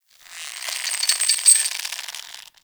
Under City Chain Rope Basket Creak

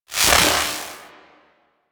Arm Movement; Zariman Power Rift; Warframe

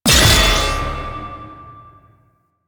Heavy Block Ability Cast; Warframe; Duviri; Dax

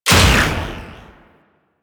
Artillery Barrage Shot; Weapons; Powers; Warframe; Archwing

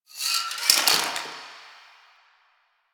Box Of Trash Rustle; Ambience; Warframe; Nineteen Ninety Nine

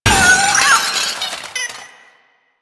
Cat Loot Crate Destroy; Warframe

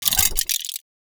Metallic Move; Enemies; Sentient; Sentient Movement

